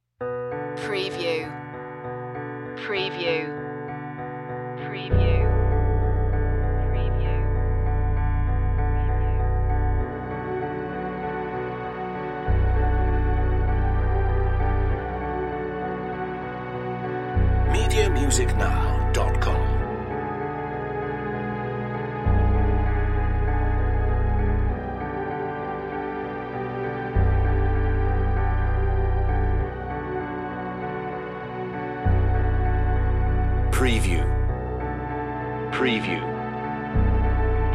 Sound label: revenge danger no drums no low melody